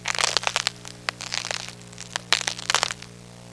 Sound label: ice cracking